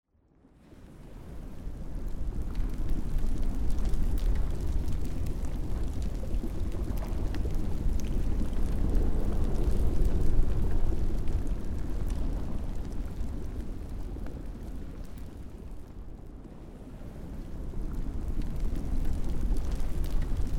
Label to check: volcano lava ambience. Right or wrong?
Right